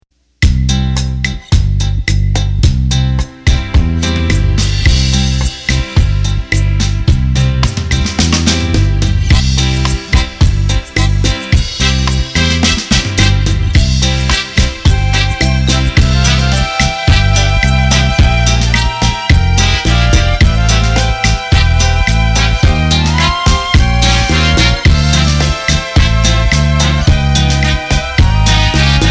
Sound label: Rumba, Roland accordion; roland bk7m